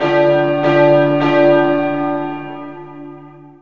ataque

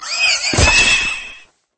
caida

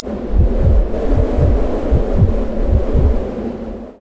aire

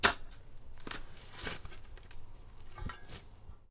cavar

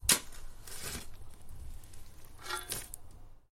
cavar

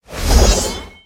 cargar inicio

